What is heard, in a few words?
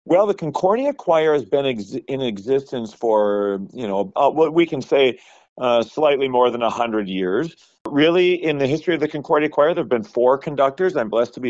Choir